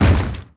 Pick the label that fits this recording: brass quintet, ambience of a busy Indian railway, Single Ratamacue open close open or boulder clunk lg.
boulder clunk lg